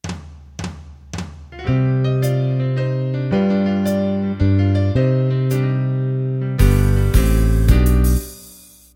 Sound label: Country 3-4 Intro